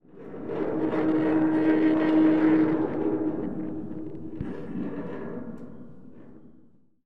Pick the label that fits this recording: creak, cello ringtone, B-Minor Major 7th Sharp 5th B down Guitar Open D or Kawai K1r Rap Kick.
creak